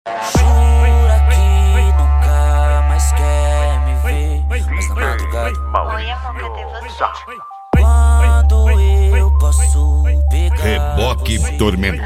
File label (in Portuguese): mega funk